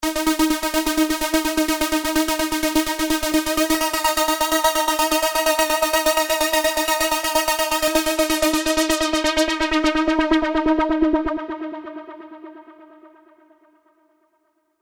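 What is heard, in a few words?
Spire, arp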